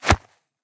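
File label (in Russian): promakh pri popytke popast v protivnika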